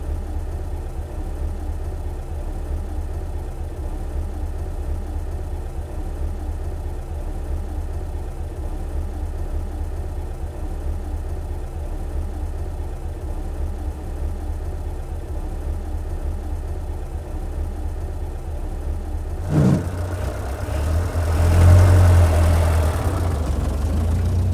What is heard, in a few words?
Car Idle, Gear, Leave; The 39 Steps